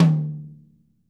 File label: mid tom